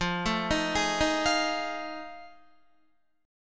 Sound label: F 7th Sharp 9th, Guitar; F7#9 arpeggiated